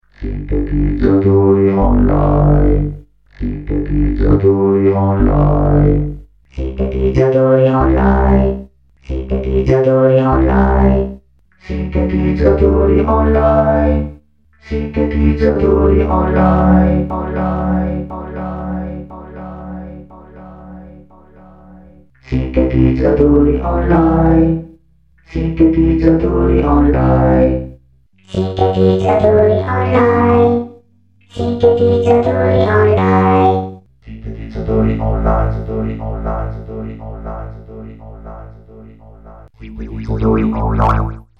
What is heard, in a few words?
vocoder